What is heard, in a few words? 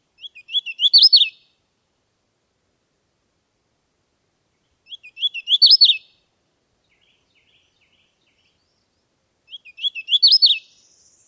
songs